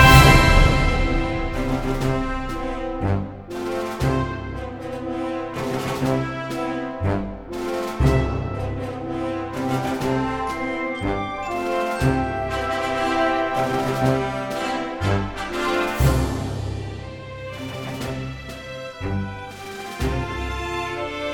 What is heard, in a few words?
Music March Of Nobility; Dungeon Punks; ps vita ps4 windows xbox one